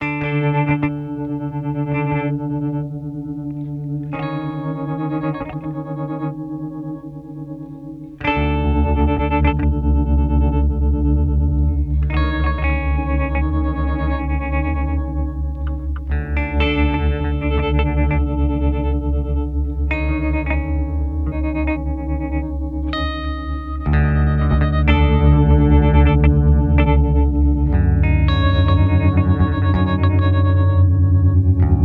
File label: Cooper FX Outward V2